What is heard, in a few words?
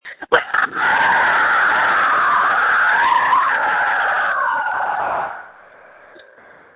Scream